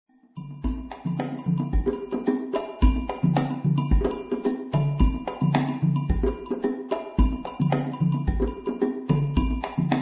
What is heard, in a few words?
Groove, music only